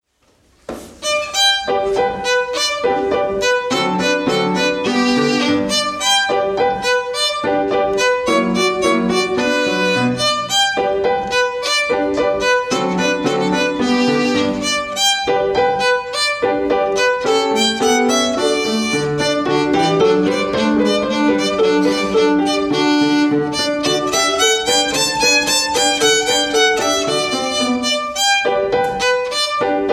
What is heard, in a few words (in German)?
Sonate C Dur; Mozart